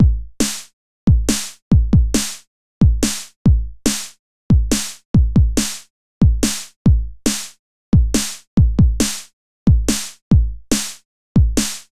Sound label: Bd and snare